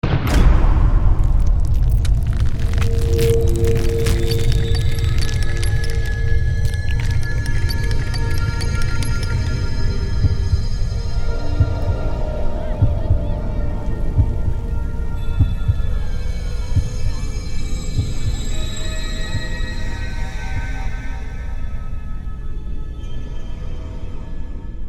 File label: SFX